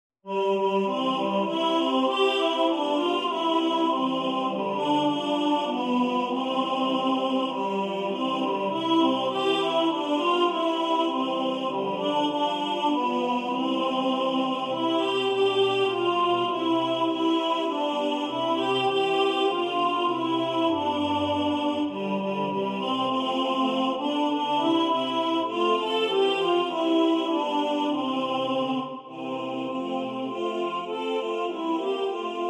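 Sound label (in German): Tenor1 im Vordergrund, Männerchor; Gegrüßt seist du Maria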